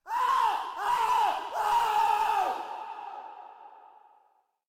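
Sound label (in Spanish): Gritos, screams; yesterday origins gamerip 2016 switch ps4 pc ios macos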